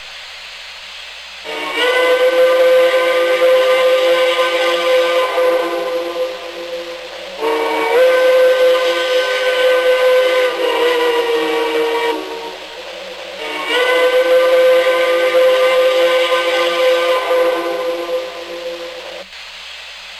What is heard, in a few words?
Steam Whistle Only, train